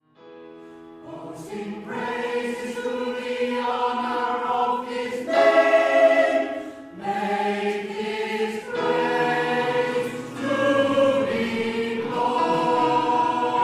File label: With a Voice of Singing, choir; Martin Shaw